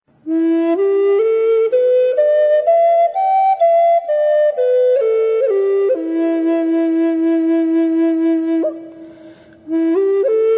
em tiger bubinga, flutes; tiger maple bubinga purple heart

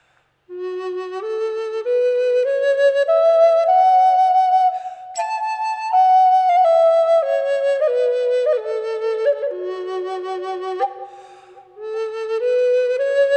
amboyna f sharp wet, flutes

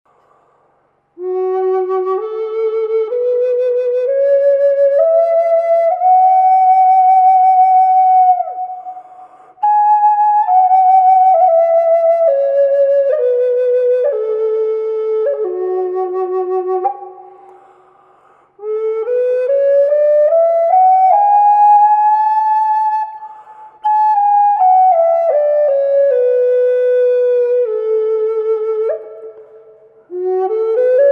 F-sharp Wet, flutes; Christmas

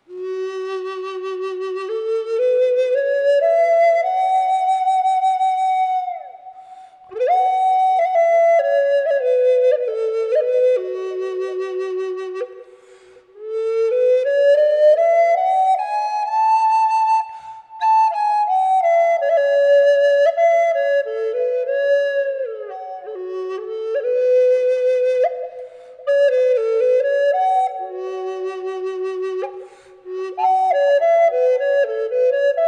mallee f sharp wet; flutes

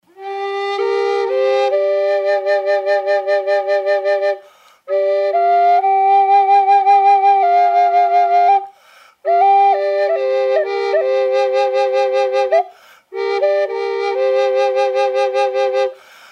Gm Birdseye Inlaid Drone Dry, flutes; drones

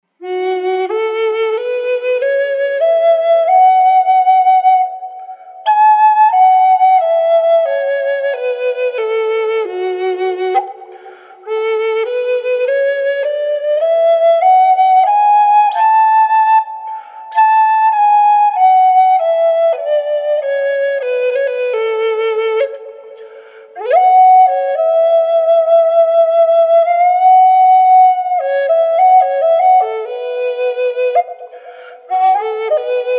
F-sharp spalted maple wet, flutes; spalted maple burl

